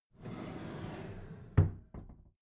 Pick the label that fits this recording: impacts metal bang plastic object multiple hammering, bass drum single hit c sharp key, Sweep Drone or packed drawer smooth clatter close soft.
packed drawer smooth clatter close soft